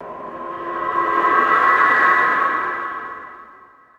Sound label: eerie breathy synthesized swell swoosh